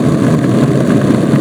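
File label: roll concrete smooth, terrains; Tony Hawk's Project 8; XBOX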